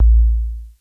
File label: PER, TECHNO PERCS; Crypton - DrumBase5000